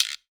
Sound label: GUIROS; EdgeSounds; Drum Mashines; R-8 DRUMS